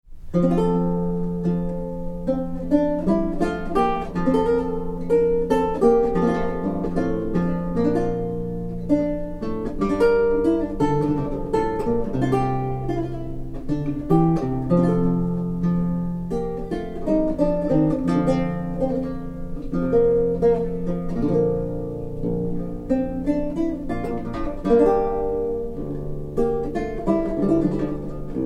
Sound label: archlute; gianoncelli; tastegiata